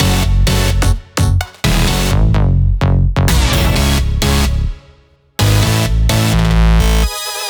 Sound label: 128BPM Synths Root F#; Sunday; Vengeance Samples; Sunday Kit